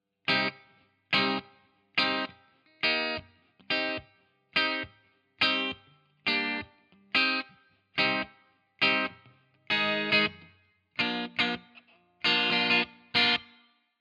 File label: free les paul; chiqui audio